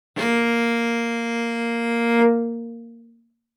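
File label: Cello arco fortissimo sul A Bb4; MIS Pitches - 2014; Strings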